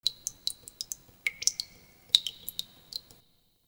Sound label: Terrarium Drips; Warframe; Ambience; Entrati